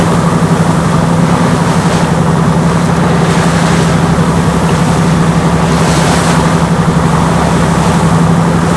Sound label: boat engine; War Thunder